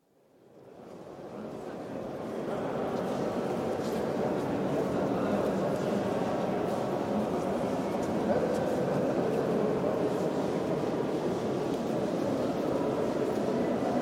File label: Istanbul, Hagia Sophia church, interior acoustic with heavy reverb; Turkey; Church Sound Effects; BBC